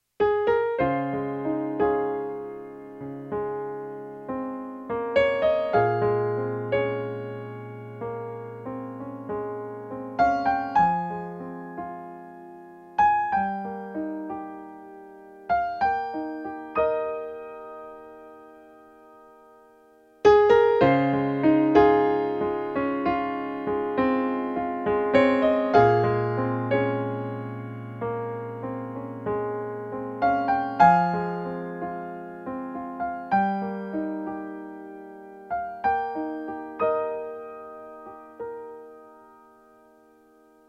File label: solo piano